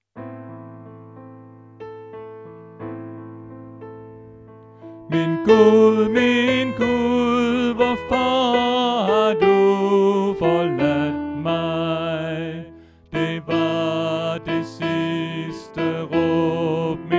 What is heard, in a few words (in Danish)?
Sopran; Påske; oevefiler